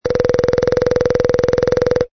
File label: BIRD WOODPECKER